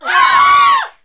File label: noise, classic vox; Best Service; Hallelujah